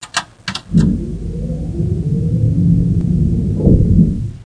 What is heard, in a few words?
Engine start; Big bang